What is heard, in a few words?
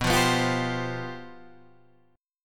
C Major 7th Suspended 4th